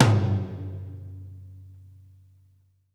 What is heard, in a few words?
RACK PROCES, TOMS; Sampleheads; New York City Drumworks VOL-1; SP TOMS